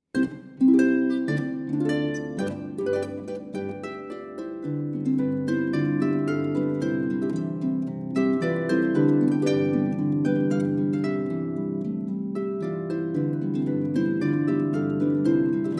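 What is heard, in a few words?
Kohlbrenn-Marsch, auf Harfe & Hackbrett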